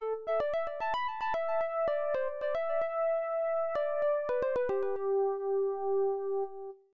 Soldier's Flute; Dragon Slayer The Legend of Heroes II; PC-9801 OPNA

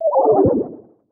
Celestial Charge Deactivate

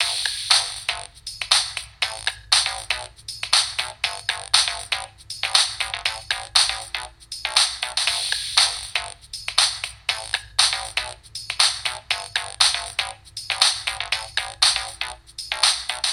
Tv Music

